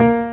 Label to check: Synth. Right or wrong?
Right